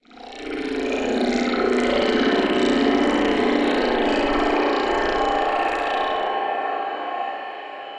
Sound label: MPE Water Texture; Laboratory; Experimental MPE; Drone